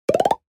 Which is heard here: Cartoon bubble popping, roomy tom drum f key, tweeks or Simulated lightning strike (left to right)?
Cartoon bubble popping